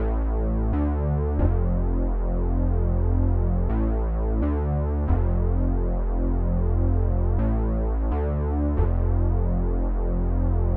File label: 130 BPM F Min Bass, 1980s; Melodies; Loop Stems; Cymatics; Cymatics - GHOST (Beta Pack); Boardwalk